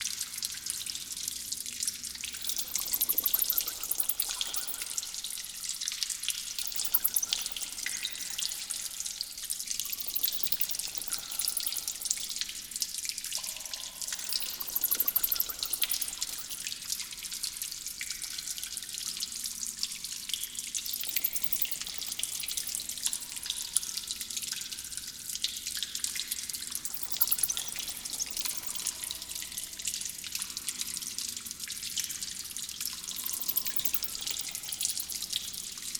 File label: dark dungeon sfx general